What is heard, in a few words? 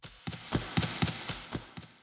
footsteps